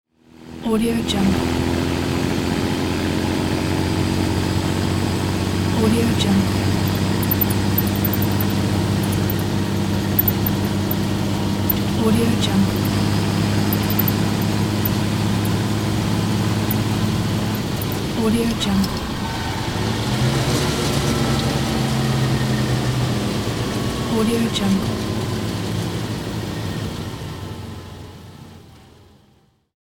military weapons carrier drive

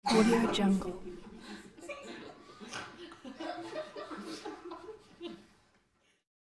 giggling and snickering from group

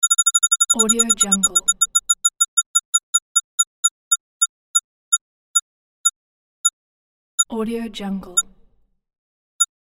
electronic wheel spin

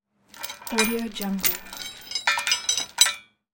plastic bottle

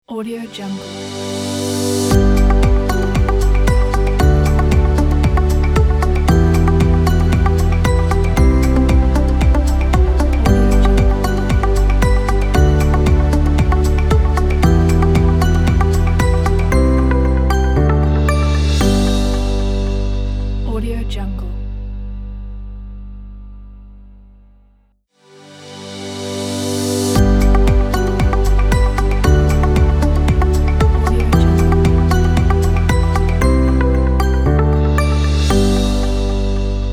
ambient logo background

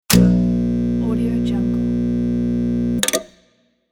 electric transformer powerup

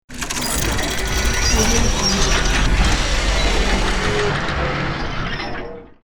mechanical transformation

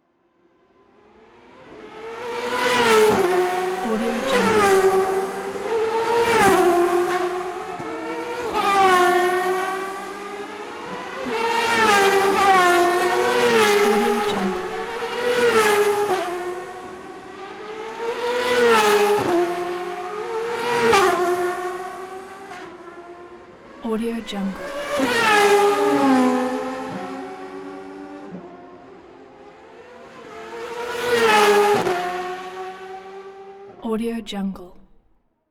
thirteen indy cars pass by; hollywoodedge

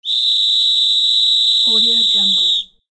whistle

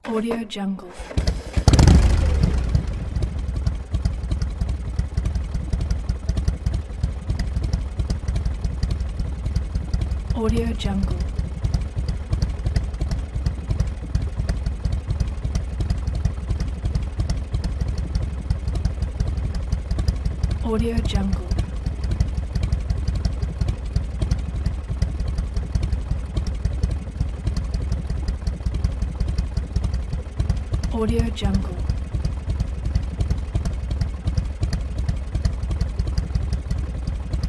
motorcycle

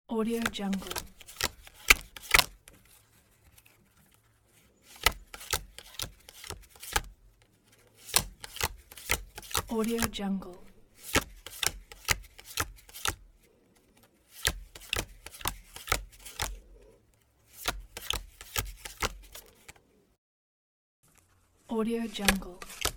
playing cards

